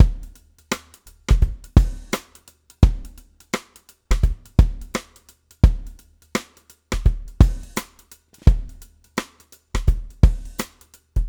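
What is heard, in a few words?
swing stick, swing shift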